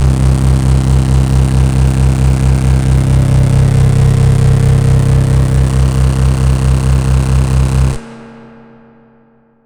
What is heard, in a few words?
Venus Multiband, Basses; Drum & Bass; AMG - Prototype Drum & Bass